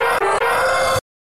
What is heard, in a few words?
attack; steel mechagorilla